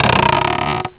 bridge active; Nokia; 5800 XpressMusic; Bounce Touch

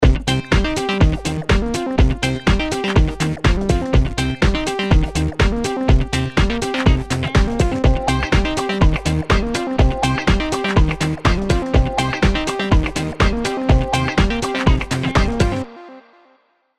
Shotel Spin Ringtone, House; HTC; House 1

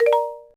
video start, phone tones; LG GD570 dLite

